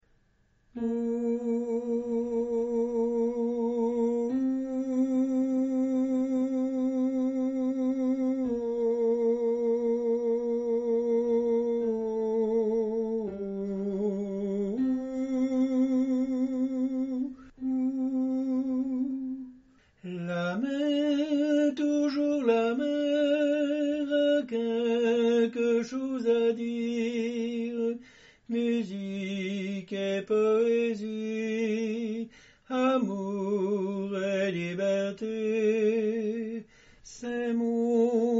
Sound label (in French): tenor